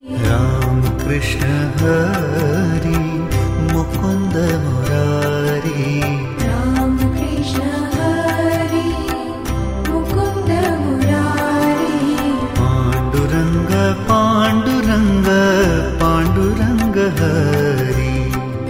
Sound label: Devotional Ringtones; Ram Krishna Hari; Jai Shri Krishna